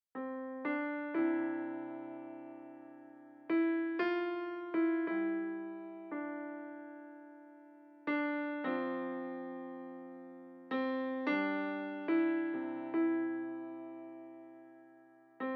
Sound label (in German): Klavier